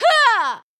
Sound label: combo, onehand sword; warrior